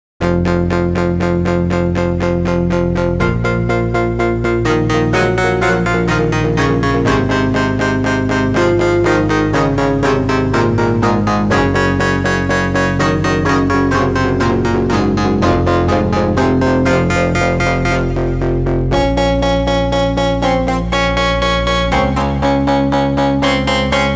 wind energy seq; the digital life

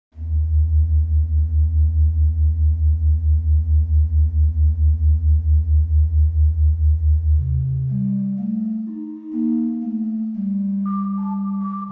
windpipes; makara peak

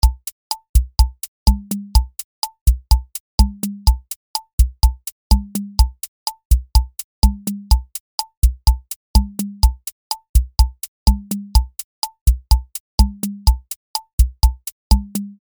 mambo; tiny pops; superwave

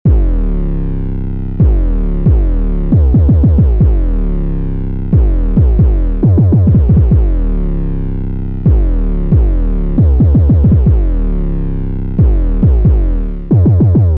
drip, trap; 2 8 expansion trap pack bundle purple edition

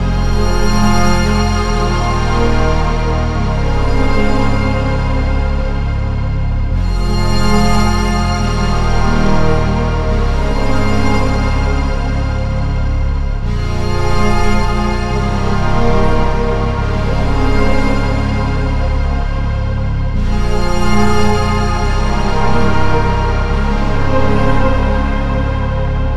synth string pad

